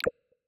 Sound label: Frog; macOS system sounds